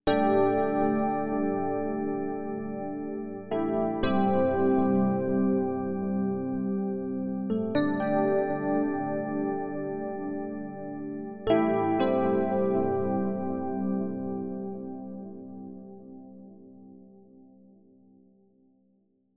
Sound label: Rapid DX7 Rhodes; Parawave